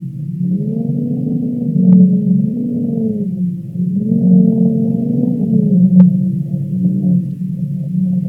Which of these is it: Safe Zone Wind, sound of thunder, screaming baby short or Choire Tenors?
Safe Zone Wind